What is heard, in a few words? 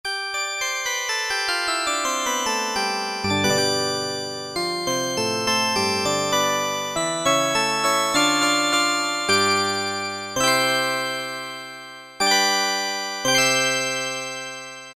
celeste, Kawai SX-240